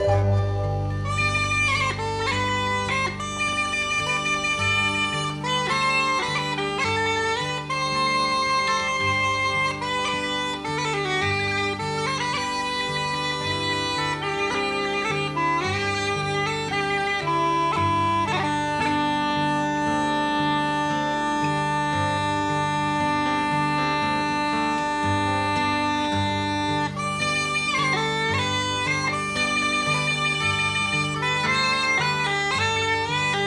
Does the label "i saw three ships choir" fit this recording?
No